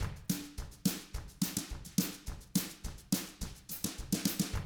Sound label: TRAIN, Drums; ILIO; Double Platinum Drums 1